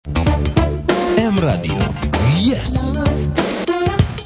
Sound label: jingle, radio; m radio